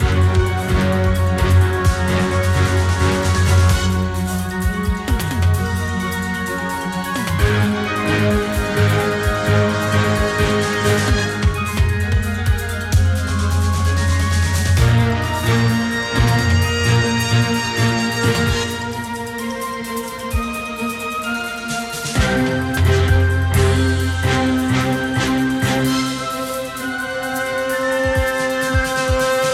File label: Extra Spins Music; knight rider